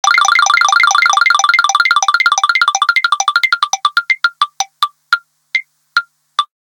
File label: music spin vib; Sony Ericsson A8i